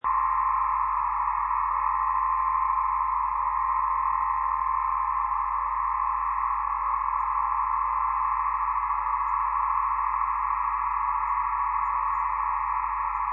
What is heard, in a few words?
Science Lab Loop, Sci-fi ambience. Multiple scanners, computers and instru; Multiple scanners, computers and instruments processing data. Laboratory atmosphere